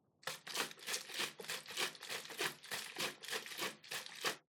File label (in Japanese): ペットボトルを振る音